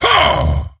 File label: Attack; Kologarn